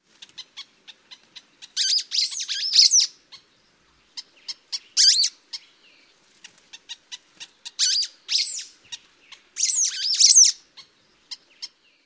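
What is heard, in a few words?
sulphur-bellied flycatcher calls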